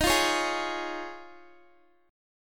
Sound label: Eb 7th Suspended 2nd Suspended 4th Eb, Guitar; Eb7sus2sus4; strummed